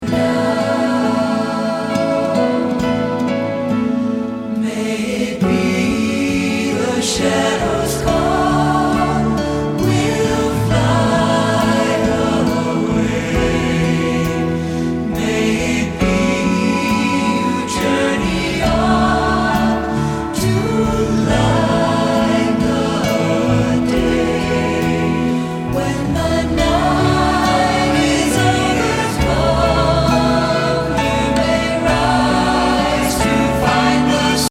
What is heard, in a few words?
Accompaniment Only